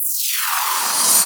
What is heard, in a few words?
Misc Short FX